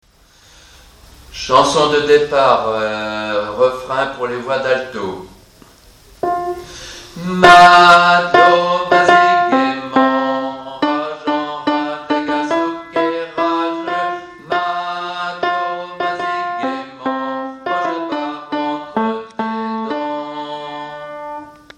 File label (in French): chanson de départ refrain